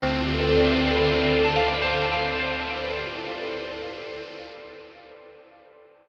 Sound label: harp arpeggio exciter; Music